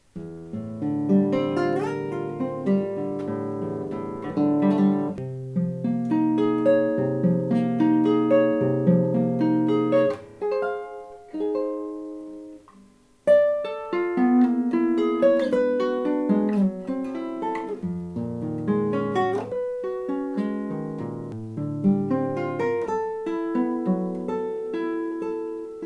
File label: harp